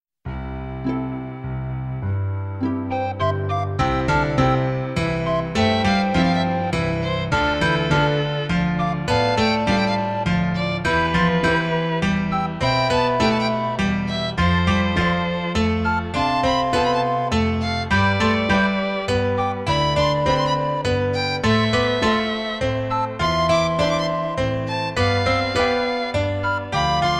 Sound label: waltz